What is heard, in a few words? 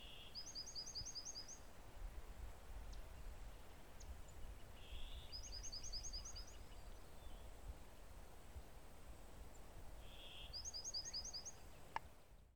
Bachman's Sparrow